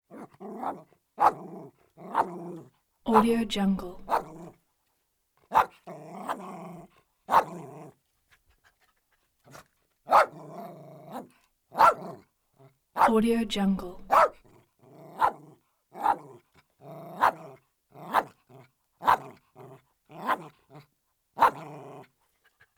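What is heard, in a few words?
terrier small dog close up snarly barks whines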